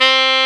SAX XSAX, Saxophones; All Saxes

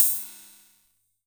Cym, Korg KR-55B

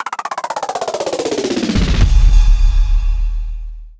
Snare Roll, Trance; Sonic Foundry (Sony Creative Software); Trance NRG VOL-2; Drums

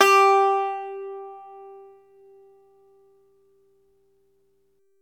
ARCHTOP, Arch Top Banjo

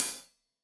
Hat Loose, Hi-Hat, Cymbals Stereo

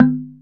Tongue Lo; Planet Earth; Digital Sound Factory Planet Earth

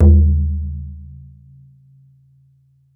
BODHRAN, Celtic Percussion

